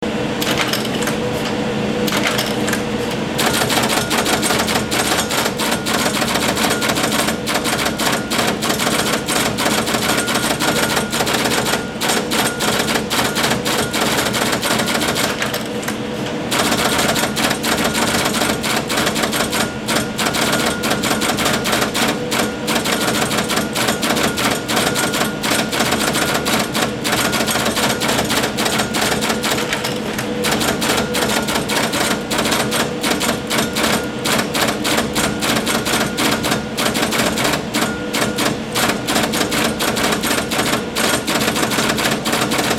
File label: IBM 026 Key Punch